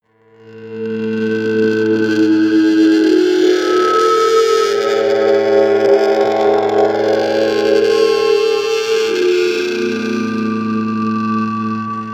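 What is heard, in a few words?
ghost ship, pads; flaechen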